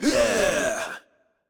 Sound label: Crowd Cheer; Pitch Black Audio Pong